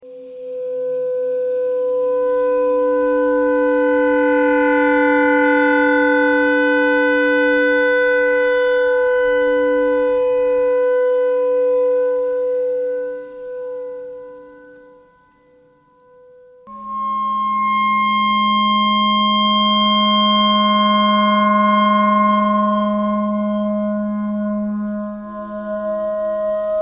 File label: clarinet notes e thread noise